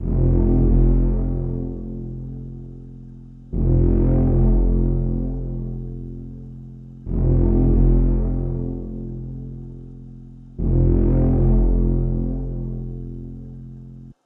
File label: 136 BPM F Min Bass, melody loop stem; Trap; Loop Stems; Cymatics; 2022 Melody Collection; Trap Pt 2; Hatred